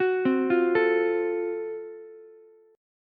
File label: Gb Minor Major 7th Flat 5th, Ukulele; arpeggiated; 11,12,13,12